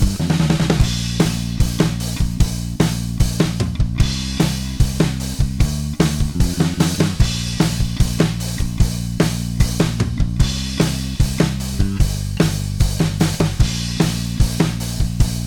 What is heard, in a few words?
bass flat; masking bass vs e-gitarre